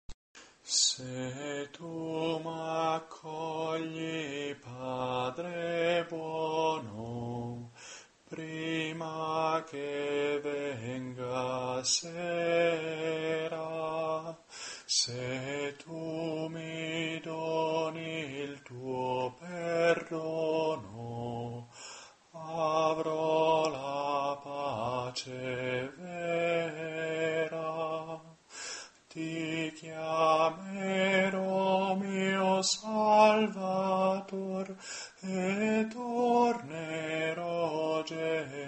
se tu m'accogli contralti, coro

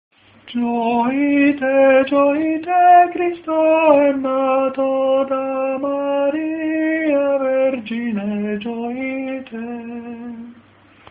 tenori

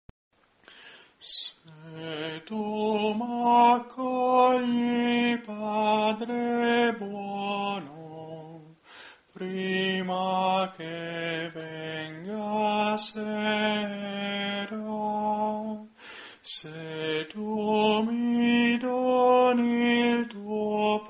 se tu m'accogli soprani, coro

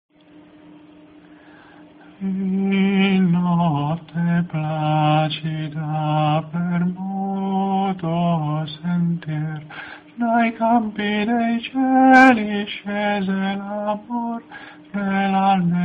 in notte placida soprani, coro

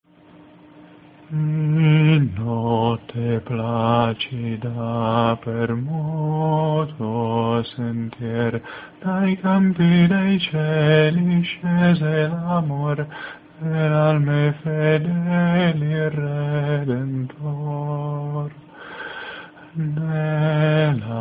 in notte placida contralti, coro

